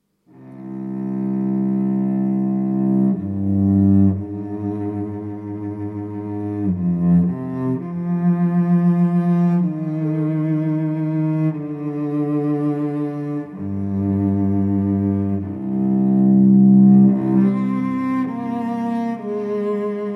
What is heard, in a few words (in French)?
violoncelle